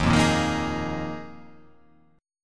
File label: Eb Suspended 2nd Suspended 4th, chord; Ebsus2sus4 strummed; JGuitar